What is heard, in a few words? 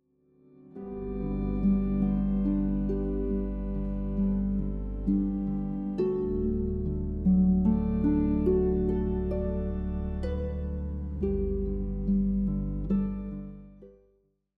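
A Gospel Prelude, harp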